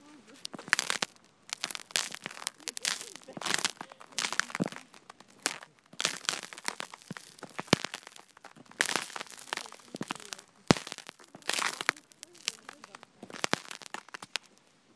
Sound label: cracking ice